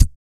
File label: BEATERBOY; Spectrasonics Vocal Planet